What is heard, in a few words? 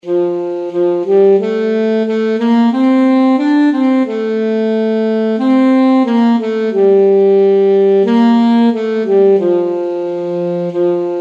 alto saxophone; long long ago